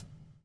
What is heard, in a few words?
BUBL, Backbeat; Spectrasonics - Backbeat